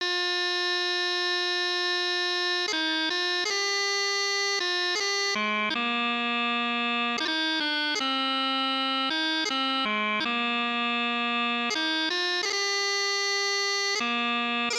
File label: Chanter